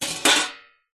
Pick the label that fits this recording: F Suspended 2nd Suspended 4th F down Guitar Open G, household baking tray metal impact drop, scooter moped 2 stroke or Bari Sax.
household baking tray metal impact drop